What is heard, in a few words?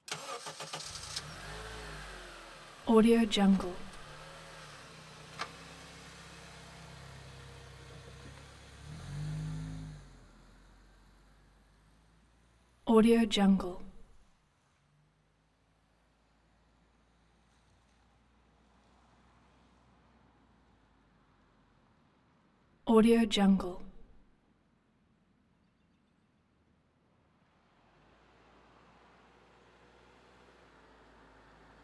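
honda element maneuvers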